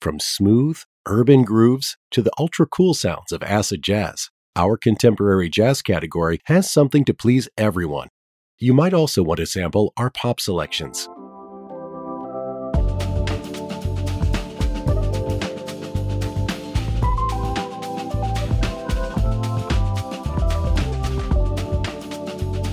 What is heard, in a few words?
Contemporary Jazz, music on hold